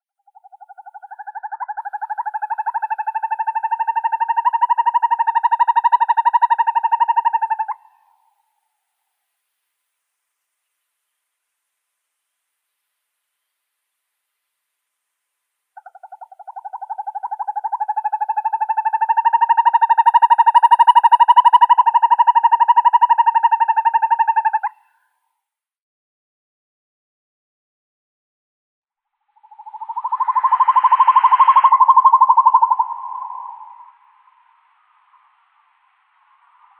sova yastrebinaya surnia ulula, zvuki prirodi

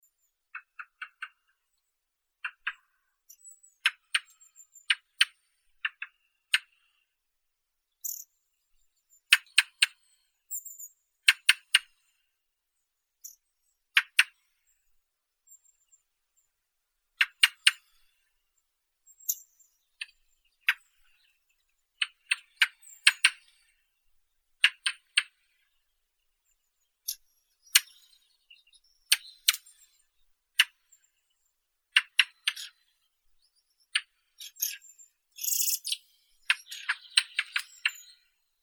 drozd belozobii turdus torquatus

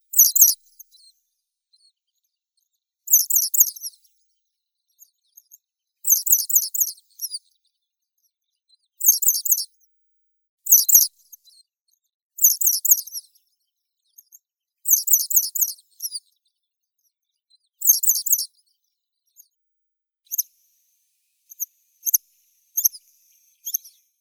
zvuki prirodi tryasoguzka zheltolobaya motacilla lutea

